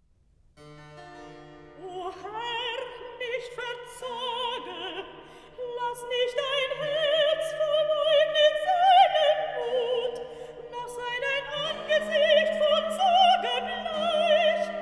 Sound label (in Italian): Recitativo; Nitocris; O Herr, nicht verzage; Handel Masterworks; Klassika